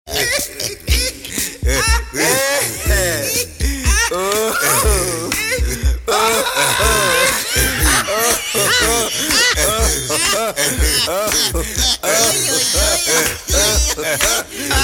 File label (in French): Fugue En Rires